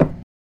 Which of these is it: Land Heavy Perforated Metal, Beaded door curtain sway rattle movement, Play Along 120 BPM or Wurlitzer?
Wurlitzer